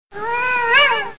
Dog Barking

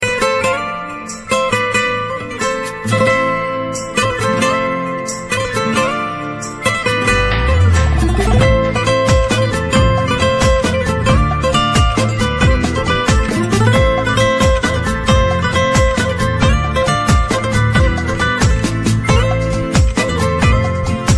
Smooth Romantic

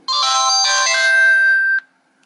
Beep

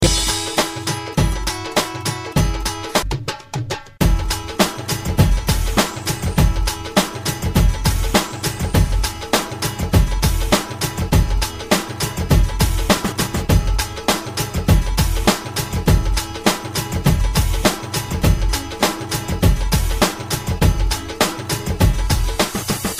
Reggae

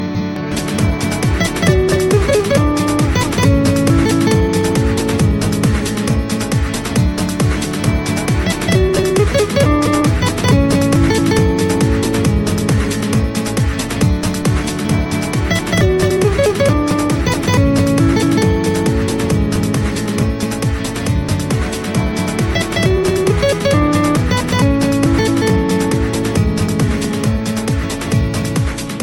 New Nokia Mix

